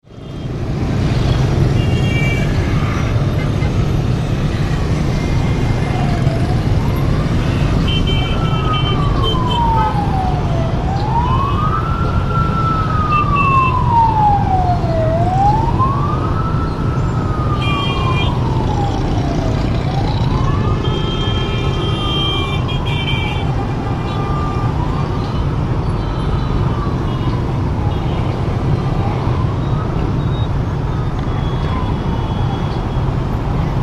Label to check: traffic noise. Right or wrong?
Right